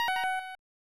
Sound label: Chance Game Correct; pro mahjong kiwame 3; snes